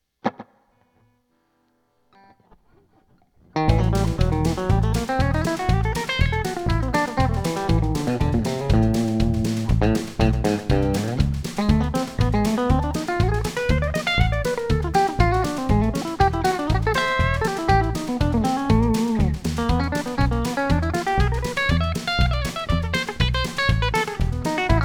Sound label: half whole diminished, backing tracks; guitar lick